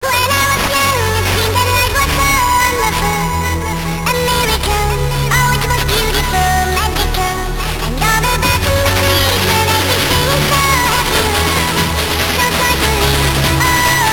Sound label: scooter